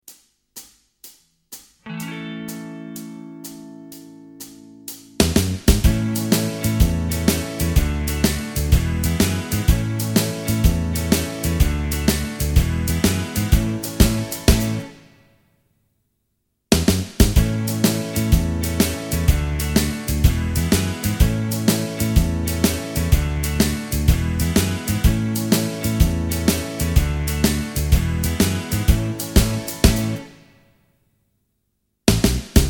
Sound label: A No Lead Guitar, backing tracks